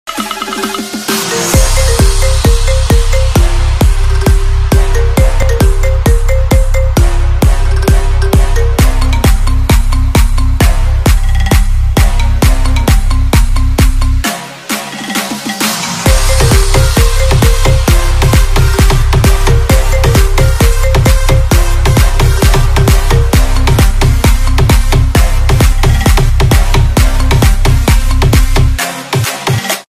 iphone ringtone remix

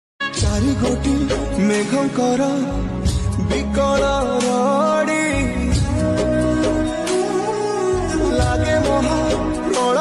bhajan odia; jagannath

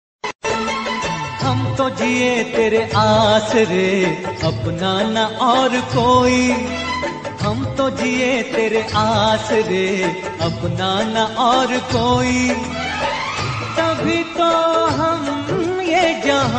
ringtone navratri special bhajan; mata rani ringtone; bhakti song

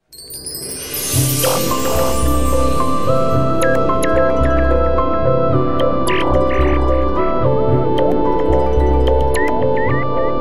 samsung ringtone; rainbow

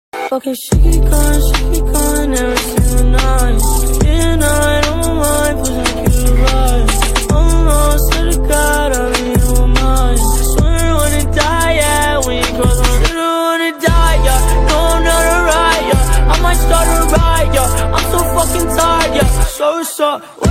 english bgm ringtone

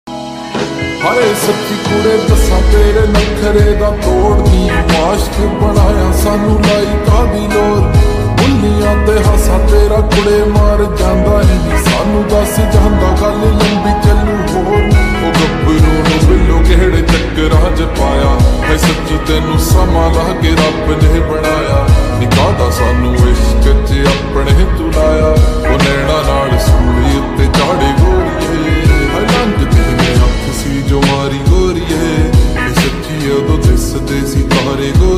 slowed reverb ringtone song lofi music

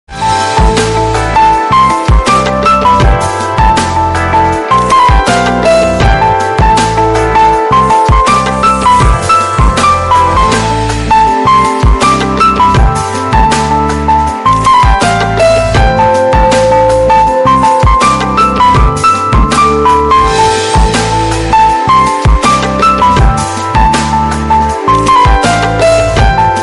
instrumental music bgm drama ringtone

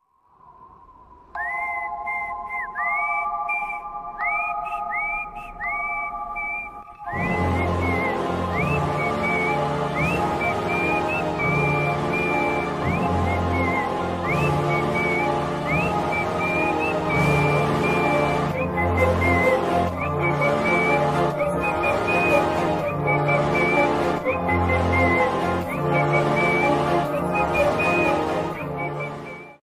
animal whistle dark bgm instrumental ringtone; ranbir kapoor bobby deol

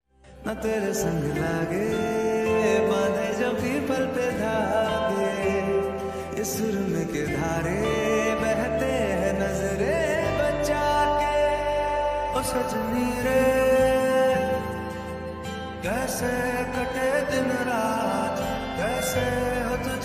lofi ringtone; ranbeer; rashmika mandana

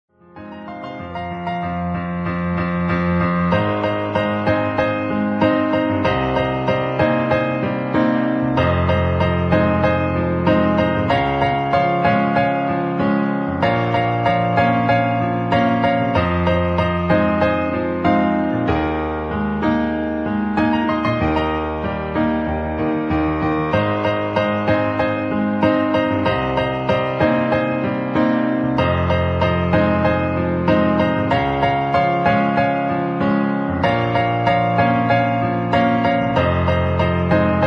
piano ringtone

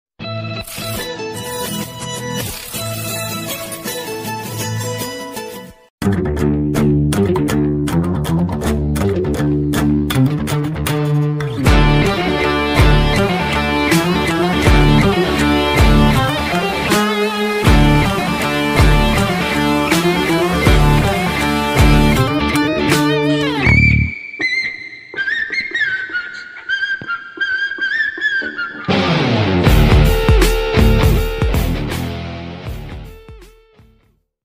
mass whistle bgm

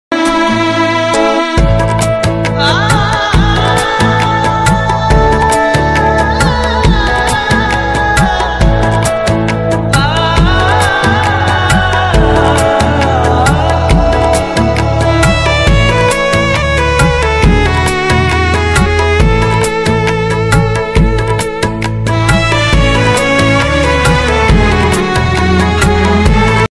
background music ringtone

